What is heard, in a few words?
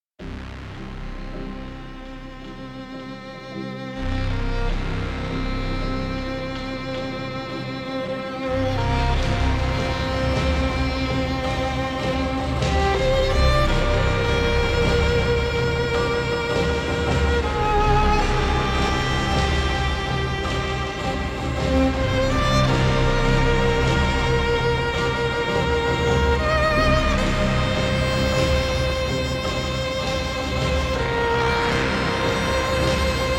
Turning point Main Full 160BPM; Production Music Examples